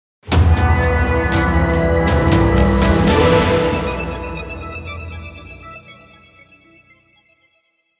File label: Item Jingle; shadow labyrinth